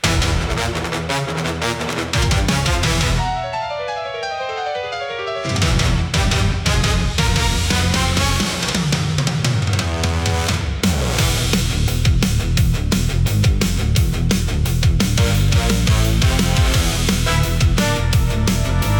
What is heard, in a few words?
boss music